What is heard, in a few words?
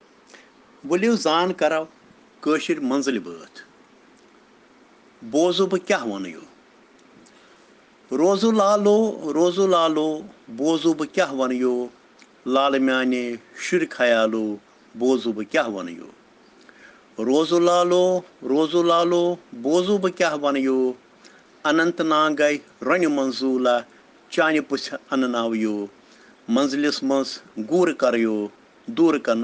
Children Rhyms in Kashmiri